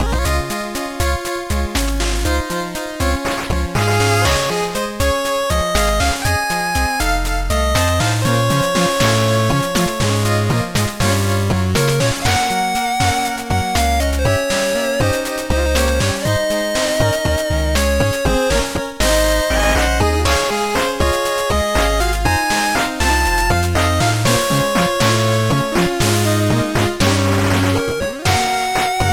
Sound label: 8Bit loop Start; HGSS; Azalea Town